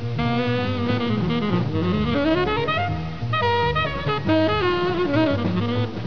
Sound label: saxophone